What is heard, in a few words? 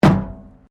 METAL BIN IMPACT PLASTIC CRASH BARRIER